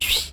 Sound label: air dash; Dream Bean